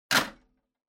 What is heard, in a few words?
Public Phone Receiver Hang Up Strong